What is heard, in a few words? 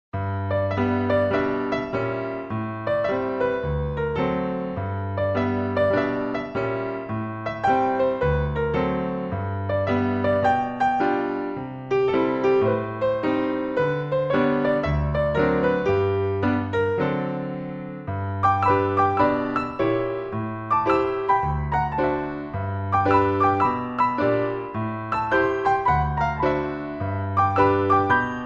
Swing; Mit Papa